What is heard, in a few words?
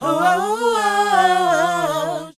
SHOO B, A#, Vocal Planet R&B & Dance; ILIO; Vocal Planet VOL-4; SHOO BE 80